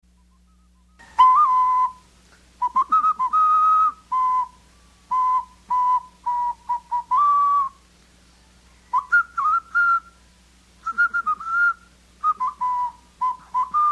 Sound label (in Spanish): Toque de ocarina Maleku; musica indigena